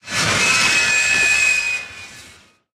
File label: brake squeak, tanks; War Thunder